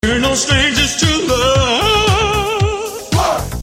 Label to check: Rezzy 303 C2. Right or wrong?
Wrong